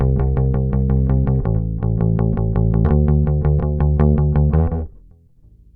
BASS

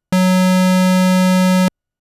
Sub F#3, keys; fleet keys Samples

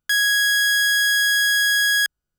Ramp G#5, keys; fleet keys Samples

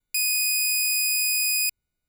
Pulse D#6; fleet keys Samples

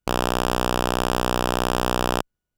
Pulse B B3; fleet keys Samples

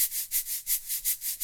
Shaker Loop

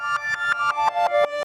RVRS

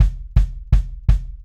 Kick Loop

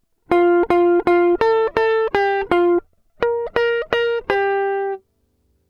gtr trem

